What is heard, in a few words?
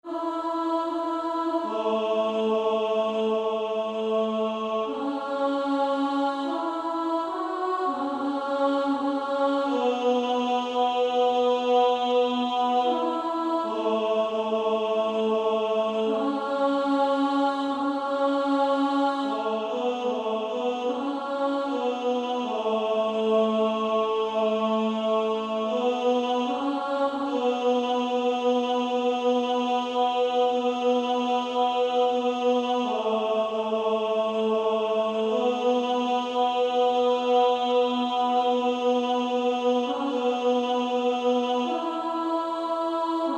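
Female Choir, alto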